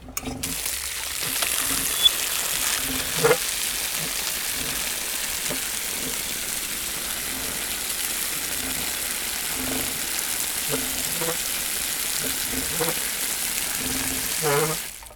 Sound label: Hydrant